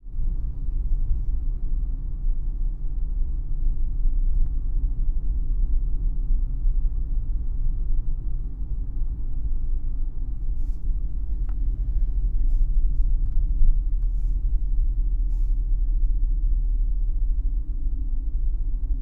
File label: car interior